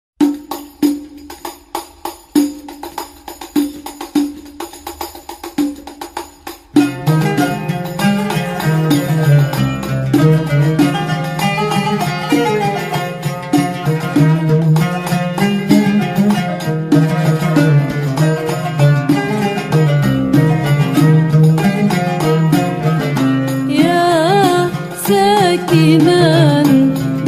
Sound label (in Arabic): موشح Andalusian Arabic